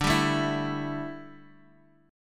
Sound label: A 7th Suspended 4th D